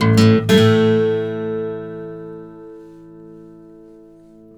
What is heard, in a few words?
BARI LICK, Blues Guitar; ILIO; Fretworks